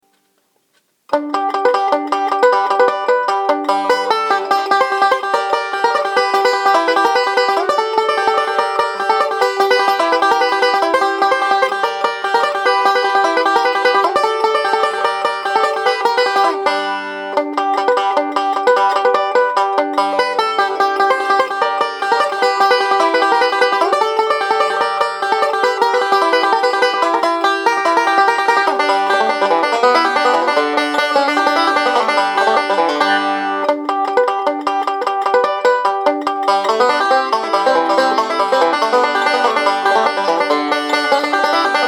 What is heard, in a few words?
bugle call